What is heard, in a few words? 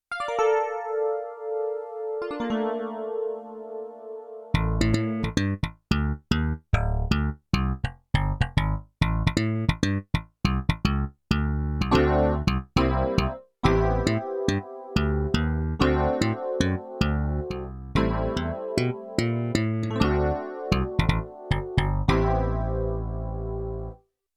Slapbass-EP Split, Yamaha TX16W; Combo Performance 1